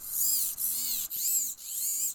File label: Servo